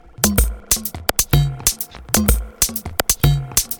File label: expelectronic 126 cenote; Wave Alchemy Loop Tools and Grooves